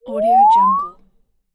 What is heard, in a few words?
cartoon whistle up; nbkstudio